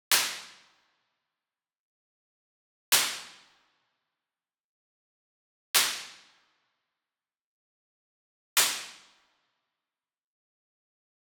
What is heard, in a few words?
Sweetener Whip Crack Hit Medium; boom library; Cinematic Strikes Construction Kit